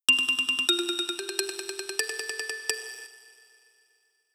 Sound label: Lawyer Phone